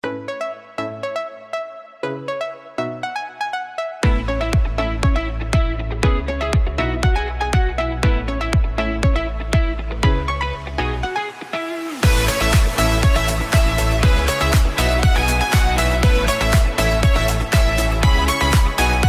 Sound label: Electric Pop Prelude, Guitar Clean Intro